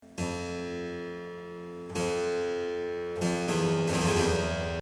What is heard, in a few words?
Link, Dark Harpsichord Music